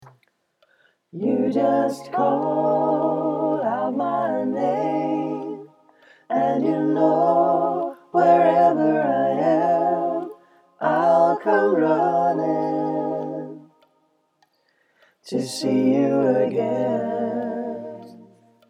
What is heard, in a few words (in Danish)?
TUTTI